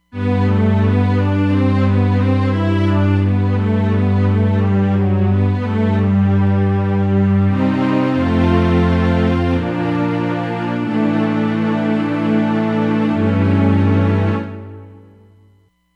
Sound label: 2-way Sect; Roland Fantom XR